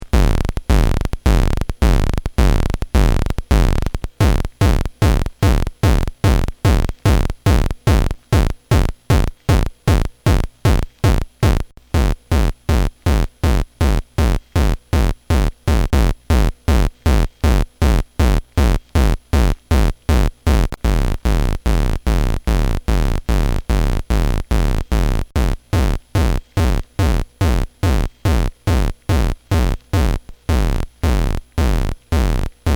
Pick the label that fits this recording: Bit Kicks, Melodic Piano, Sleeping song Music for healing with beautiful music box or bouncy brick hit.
Bit Kicks